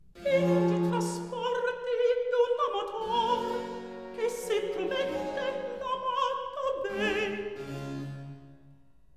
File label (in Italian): Recitativo; Pingi is transporti d'un amator; Terpsicore HWV 8b